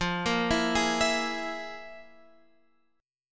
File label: F Minor Major 7th Double Flat 5th, chord